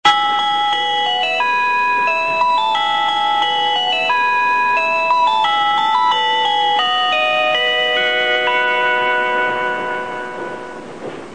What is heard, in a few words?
train chime; 白鳥